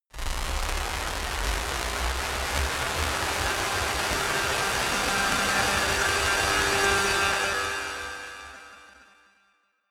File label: glitchy crackle noise long, sciene fiction